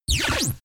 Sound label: defect laser beam